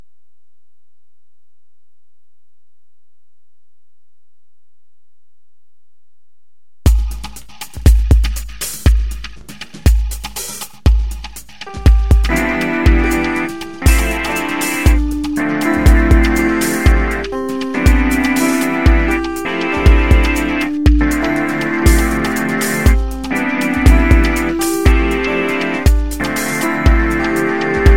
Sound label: recorder